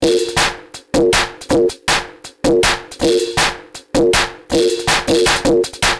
DRUMLOOP D+B 160, Drum Bass Loop; eJay

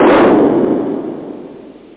clap

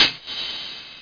hall hihat

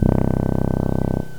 Tuba

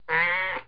babies menu popup

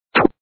PAD; pin ball deluxe

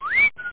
whistle

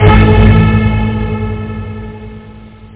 orch; da capo vol1; instruments; stabs

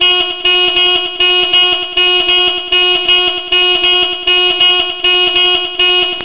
car horn

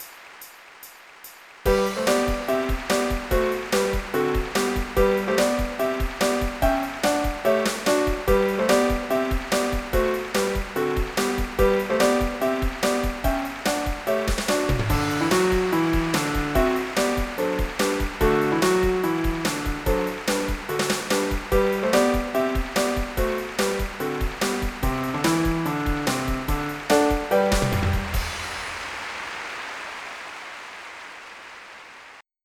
march; smarch

